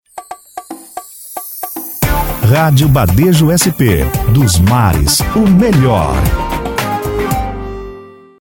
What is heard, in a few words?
pop; badejo